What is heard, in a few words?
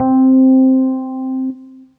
Suitcase, electric keyboards, suitcase piano; Suitcase Uncle Herbie; Ableton; Factory Packs